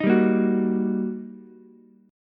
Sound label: G Minor Major 7th Sharp 5th, down, Ukulele; GmM7#5 strummed; JGuitar